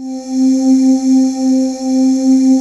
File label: SPACE VOX, JV PADS; Pro-Rec - Synthsonic